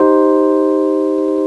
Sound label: piano keys